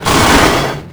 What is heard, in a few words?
hit; kfsmr flesh clown